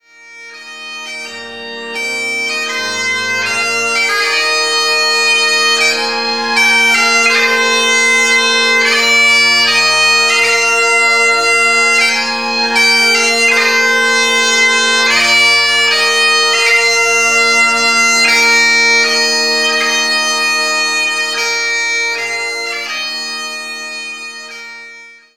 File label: Here Comes The Bride Duet With Organ, bagpiper